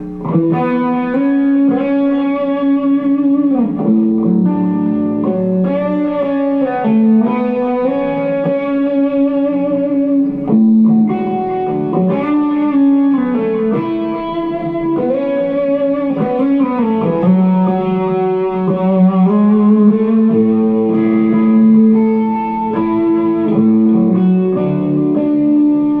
sample noise problem, sibilance when recording lead overdriven guitar; Scarlett 2i2